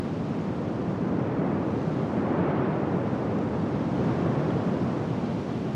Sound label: wind Particle; elements